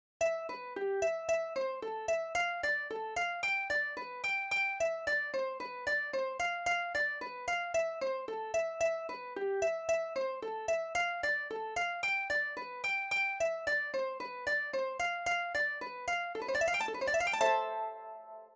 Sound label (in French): HARPE; DOXOLOGIE solennelle